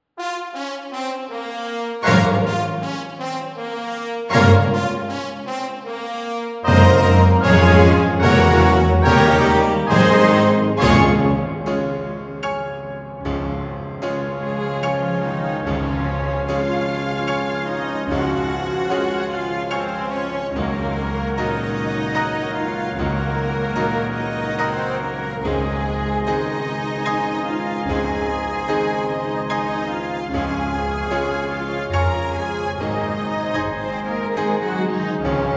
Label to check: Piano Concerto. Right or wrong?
Right